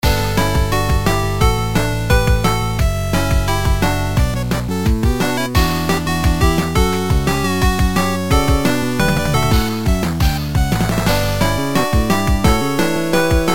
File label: 8bit; Oregairu 2 OP